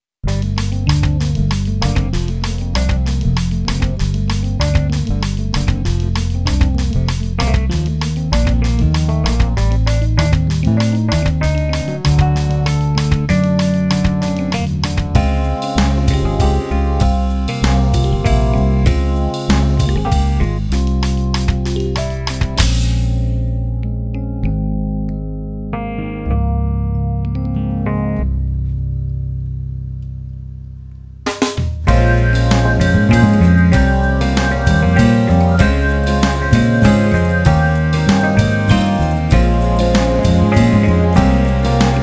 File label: funk